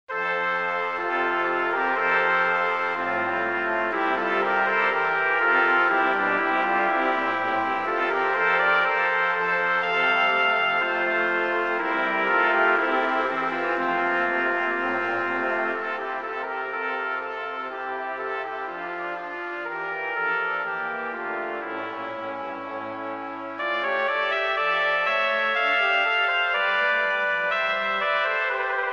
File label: Brass Quartet; Brass Quintet; Judas Maccabeas